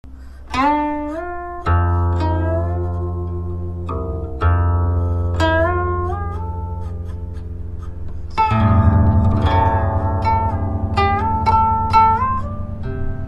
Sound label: Guqin; گوچین